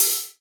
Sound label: HK LOOSE, 50S JAZZ KIT; Northstar - New Gold VOL-1